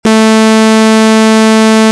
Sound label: sawtooth